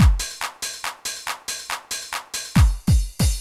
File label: techno 140